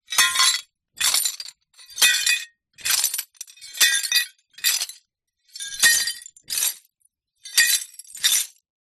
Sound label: impacts dish ceramic pieces drop lift in cloth bag multiple